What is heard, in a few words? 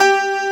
DG WAVE, DIGI SYN